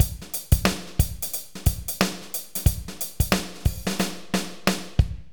bpm 90 amb; AKAI S-Series CD-ROM Sound Library VOL-4

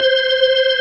B3 C 3-3, ORGAN

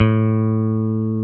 BASSES, Roland

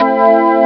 pluck organ; Amiga Soundtracker Sample Packs

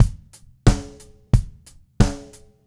Red Maple Groove 20-inch Kick 90; Sonic Foundry (Sony Creative Software); Vital Drums

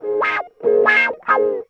Lap Steel; Trip-Hop

